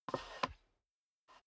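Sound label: step dress boots wood creak scuff, footsteps; schritte; menschen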